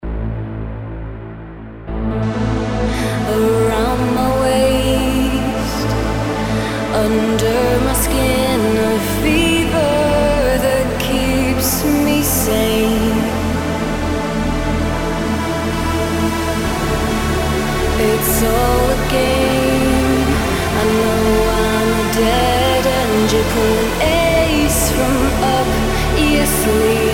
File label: synth; Killing Time; ultrastate